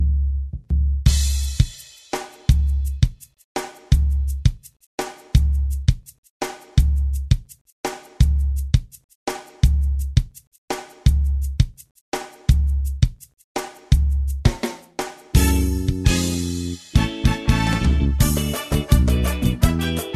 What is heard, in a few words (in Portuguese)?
Samba aí Playback